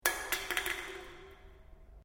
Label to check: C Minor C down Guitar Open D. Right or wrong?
Wrong